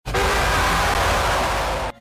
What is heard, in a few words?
jumpscare; dogday